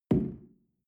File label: shell place on tablecloth soft; berufe; gastronomie; soundpool